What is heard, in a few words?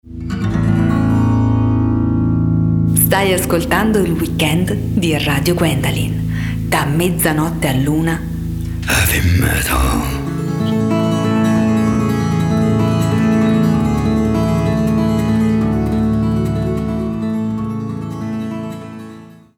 Jingle Metal; Weekend